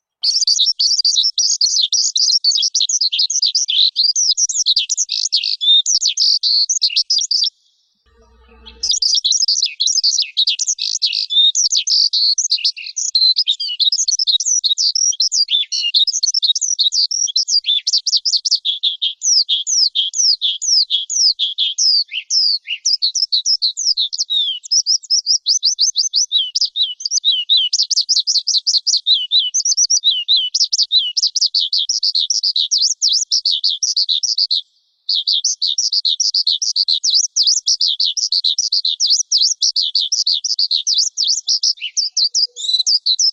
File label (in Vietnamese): Tieng chim Son Ca hot